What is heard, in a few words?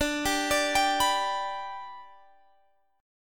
D 6th Suspended 4th D, chord; D6sus4 arpeggiated